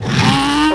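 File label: female howl; tusken; Star Wars Obi-Wan; XBOX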